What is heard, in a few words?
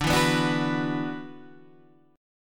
Gb 7th Suspended 4th Sharp 5th D